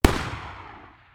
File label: magnum exterior shot